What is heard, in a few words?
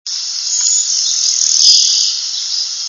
blue-winged; birdsongs